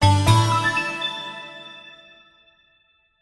multimedia game tone sudden event tone musical finish complete